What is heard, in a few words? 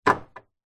vehicles car central locking in garage ext nissan patrol